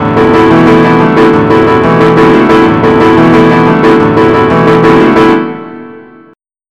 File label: RUMBA; BUSKER L